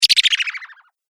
Game App Slippy, Video Game Sound Effects

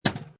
Pinball Sound Chunky Clank; Video Games

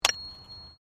Digging Small Handheld Tool Rocks

